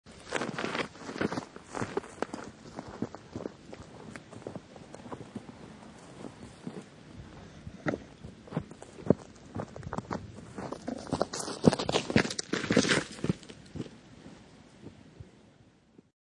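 Footsteps Walking By On Dirt, Person wearing boots walking by on dirt; on a forest path with hard dirt ground, leaves and twigs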